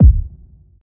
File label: huge bass drum single hit c key